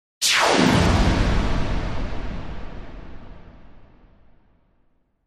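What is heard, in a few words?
Wash HF Elect Feedback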